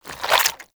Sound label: Sticky Ink Tentacle Retract; Gameplay; Mutator; Warframe; Shadowgrapher